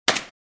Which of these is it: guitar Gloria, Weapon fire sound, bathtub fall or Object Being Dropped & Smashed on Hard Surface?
Object Being Dropped & Smashed on Hard Surface